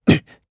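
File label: Vox Landing Japanese; Lusikiel; kings raid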